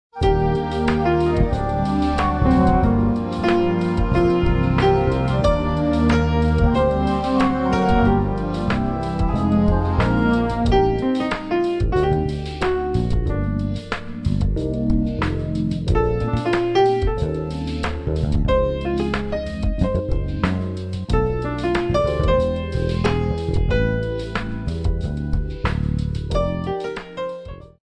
light jazz

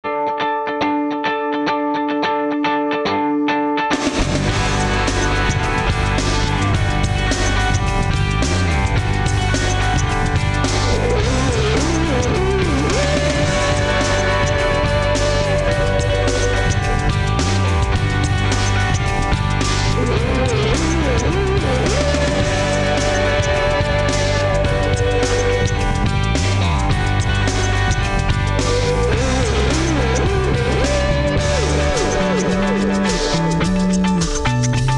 hard rock

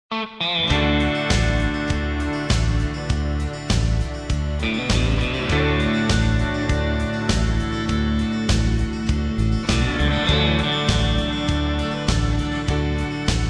country

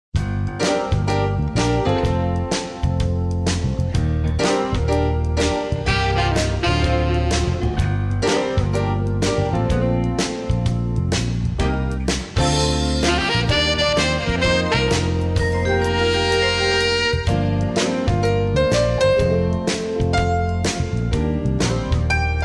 Blues

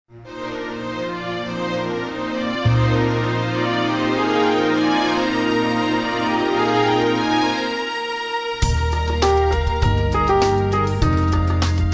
light jazz

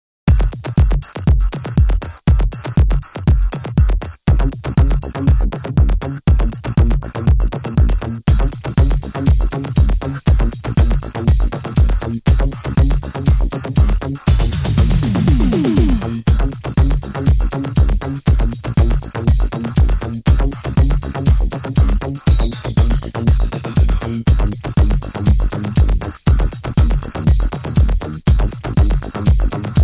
contemporary urban pop rave beat

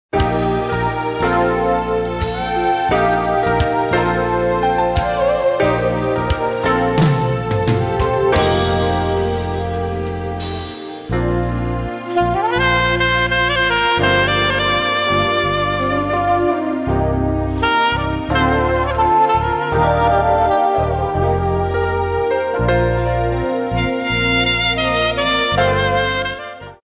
light jazz

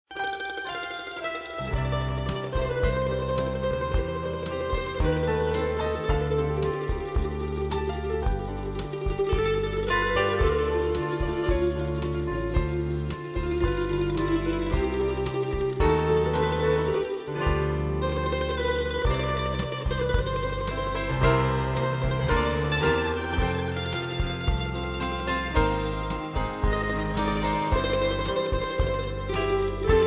Specialty Italian Italy

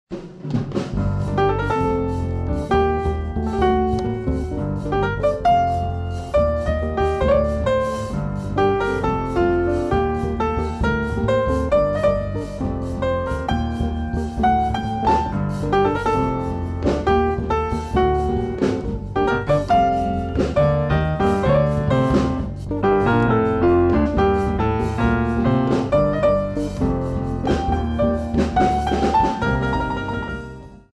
Latin Fandango

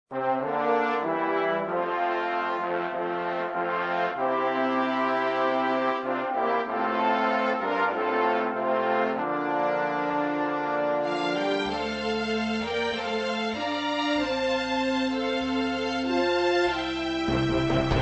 Specialty Patriotic

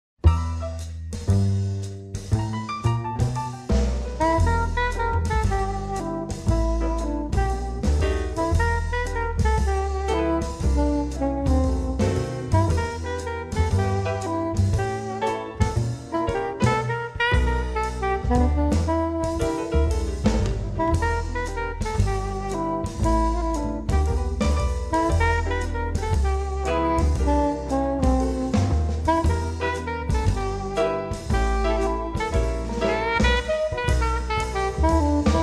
Blues

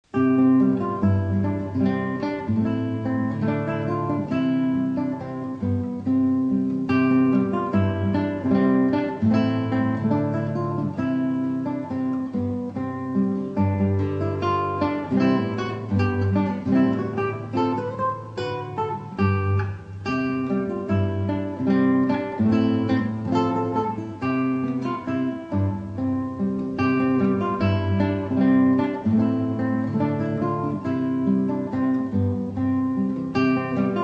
holiday deck the halls guitar

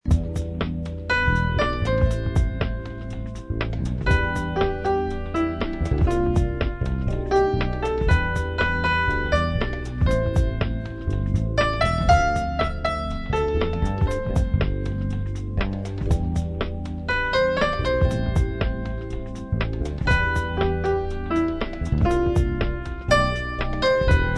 light jazz

